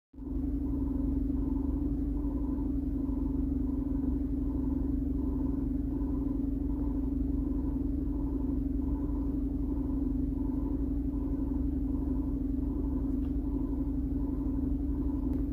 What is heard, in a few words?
BMW 320i noise